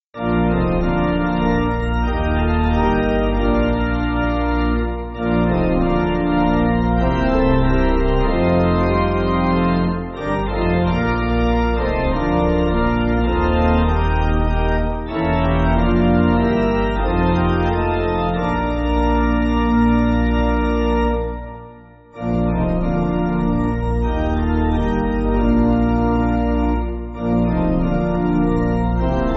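Pipe, Music Backing Files for Churches - Pipe Organ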